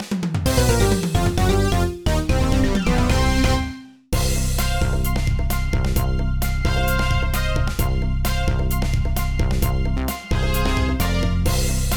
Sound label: Jingle Lose; Tennis no Oujisama Driving Smash Side King